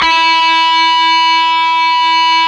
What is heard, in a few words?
GTR, Dirty Buzz; Club-50 - Foundations Roland